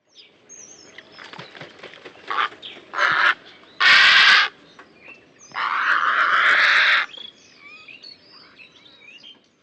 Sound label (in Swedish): Purpurhäger